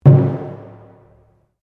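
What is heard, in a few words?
Drum Taiko Barrel forte, Japanese Taiko Drum; Miscellaneous Musical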